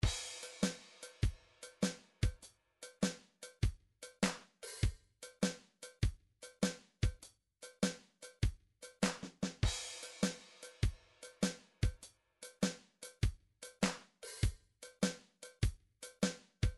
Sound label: drums, midi drums